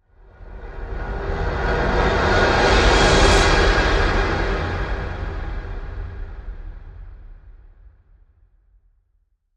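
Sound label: Reverse Slight Malice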